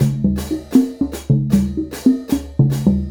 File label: Mallet Percussion and Open Hi Hat